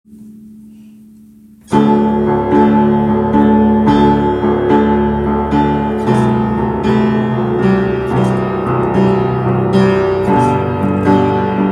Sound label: Chord progression improved - slow burn